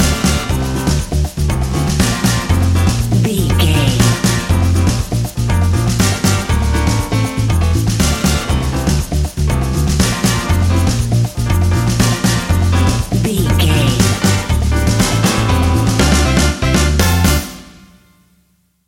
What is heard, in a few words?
fast latin spanish exotic music; An exotic and colorful piece of Espanic and Latin music